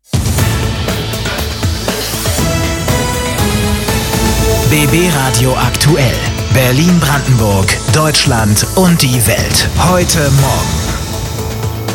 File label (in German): Opener Nachrichten; BBRADIO